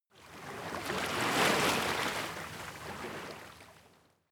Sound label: lake oneshot surf